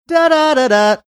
singing series of notes

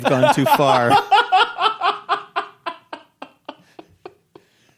awsome laugh

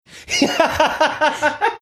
laughing

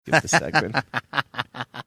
laughing

